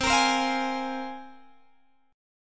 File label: C 7th Suspended 4th Sharp 5th, Guitar, Open G